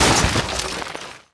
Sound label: WRECK, Vehicle